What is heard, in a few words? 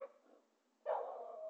dog bark distant; ambient; creatures